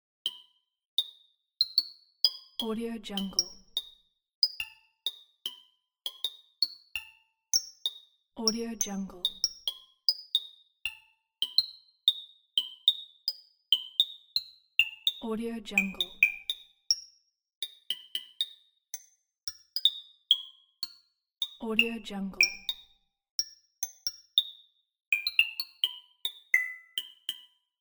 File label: waterdrops on glass